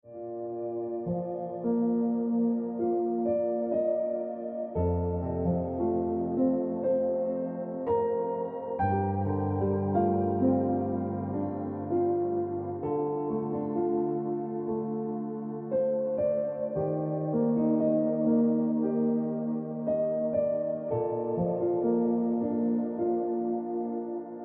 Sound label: Ringtone Piano Music